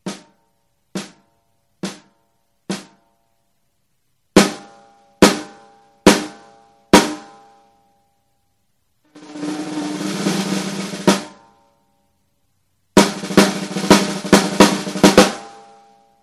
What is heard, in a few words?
radio, drumline; slingerland